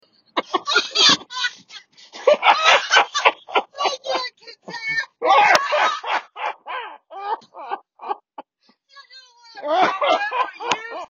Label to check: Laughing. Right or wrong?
Right